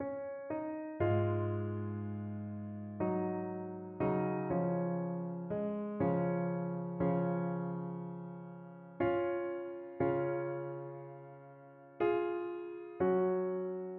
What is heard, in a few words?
Shenandoah TPT